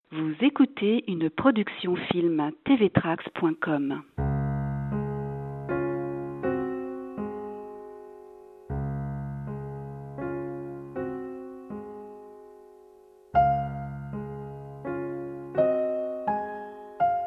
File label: Berceuse Op57 in D-flat